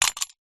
household padlock metal set down concrete ground

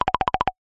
cartoon wobble flutter wood mallet style

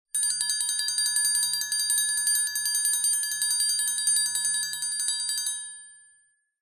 musical triangle strikes rattle clangs

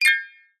multimedia alert notification glassy flick short

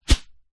sport tennis racket swing whoosh; zapsplat

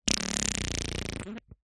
human fart; zapsplat

